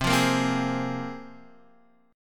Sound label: C 7th Suspended 4th Sharp 5th, C, down, Guitar, DADGAD